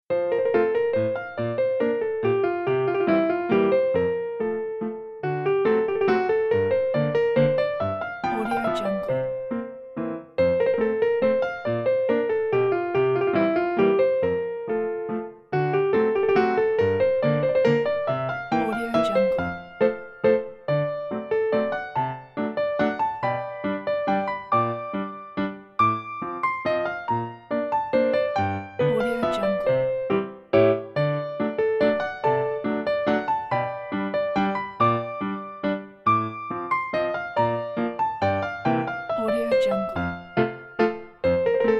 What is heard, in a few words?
waltz uplifting classical